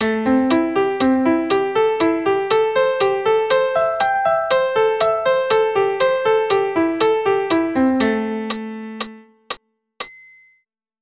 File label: Am7 broken chord, keyboard